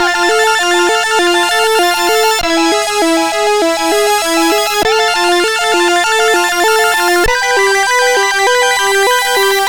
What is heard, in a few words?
C64 Chord, 8-Bit chiptune